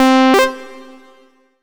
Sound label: MUSIC EFFECT Solo Clean Sawtooth Positive; startide macos switch windows gamerip 2017